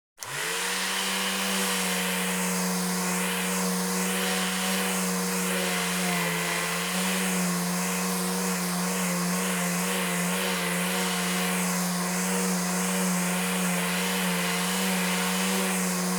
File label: Electric Sander; Household; BBC Sound Effects Library